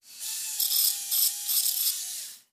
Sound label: Dentist Drill, Drilling Tooth, High Pitched, Various Short Bursts.